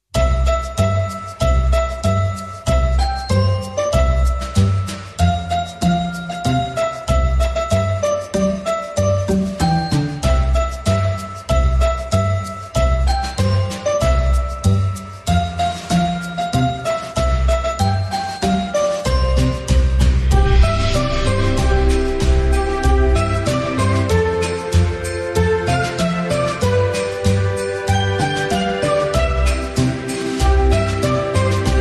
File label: Jingle Bells Music